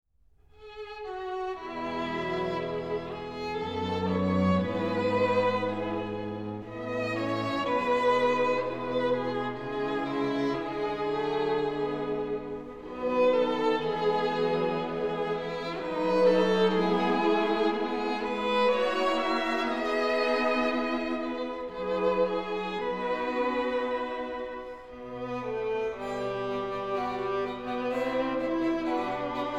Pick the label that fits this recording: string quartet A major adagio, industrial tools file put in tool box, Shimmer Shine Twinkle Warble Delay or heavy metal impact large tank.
string quartet A major adagio